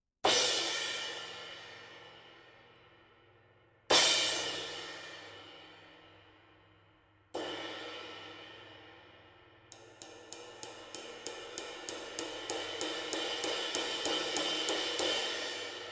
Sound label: Sabian Proto 20 AA